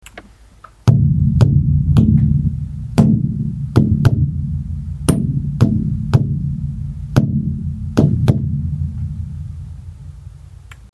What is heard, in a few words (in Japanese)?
tarudaiko